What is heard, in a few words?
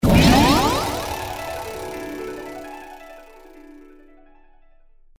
win addition, casino